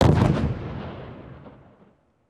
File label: distant explode; destructibles